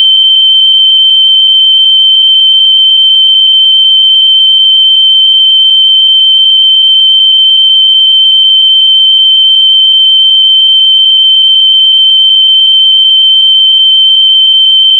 tinnitus sound, buzzing

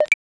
a short clean ui select; gaming

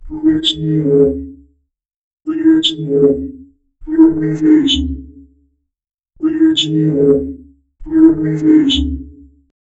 short soft and deep sound

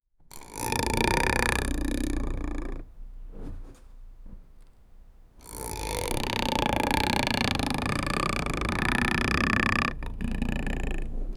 an old chest is opening, creaks

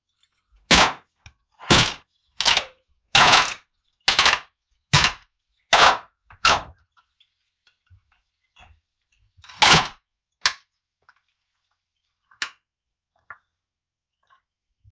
beverage

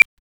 retro pixel art style

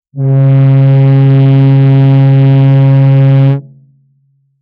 car; mad max inteceptor car; post apocalyptic

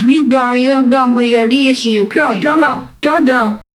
the sound of a crowded, egypt

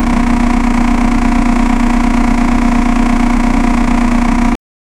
engine sound low rpm loop; engine sounds

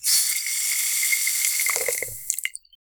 the sound of thick creamy, beauty

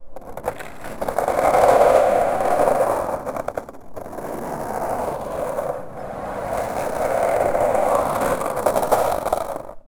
continuous scraping sound, ice skating

